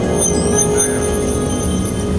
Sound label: activate